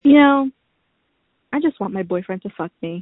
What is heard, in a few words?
screams